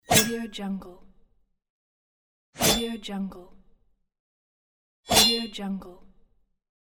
axe swing; aetherfx

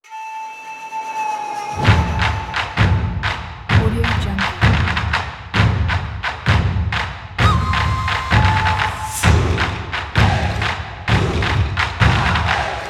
tribal

